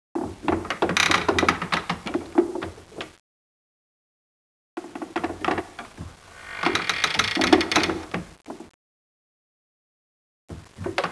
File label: creak